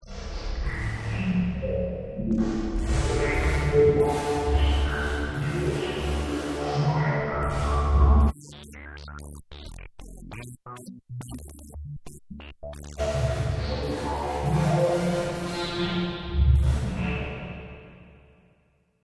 bypass, XP: bypass fx DSP; yamaha sy99 synth

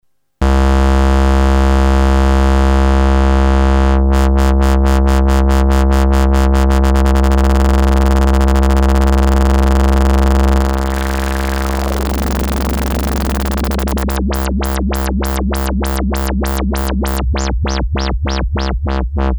test lfo sine; Solton SM100

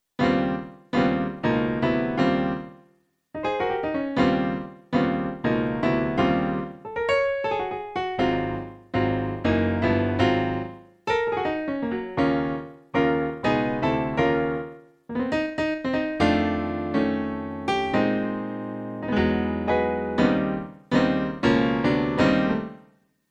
piano; korg n1r

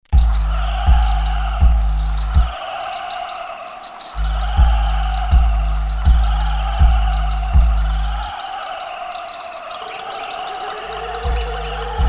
seq teco jungle, Alesis qsr synth

